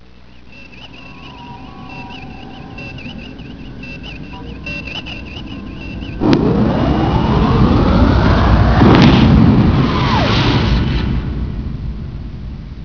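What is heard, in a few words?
WARP DRIVE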